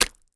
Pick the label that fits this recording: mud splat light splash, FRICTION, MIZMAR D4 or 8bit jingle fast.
mud splat light splash